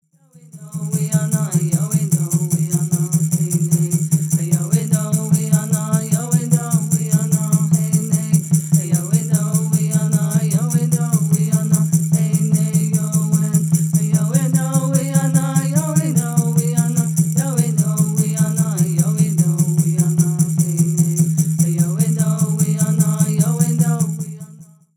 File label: Peyote Song